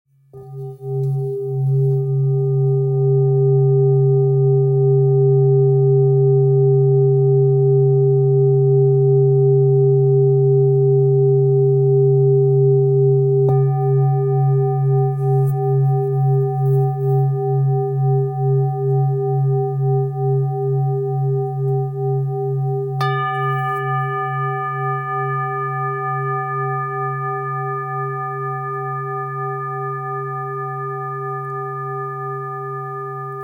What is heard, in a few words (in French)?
Bol super lune belier do diese 1.305kg